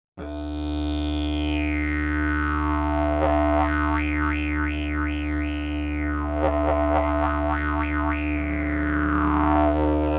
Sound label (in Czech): jilm, didgeridoo; zilovy